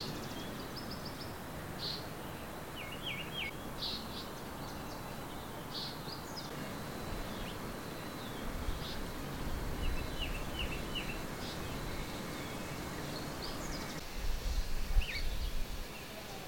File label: Nature Ambiance